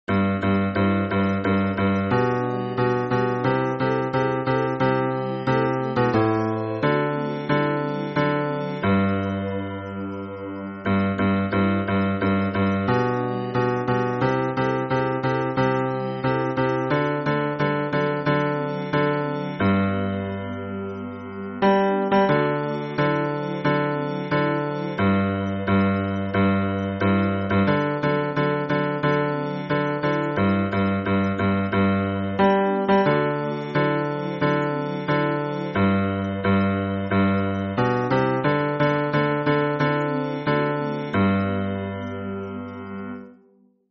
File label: hymn bass; 末日聖徒イエス・キリスト教会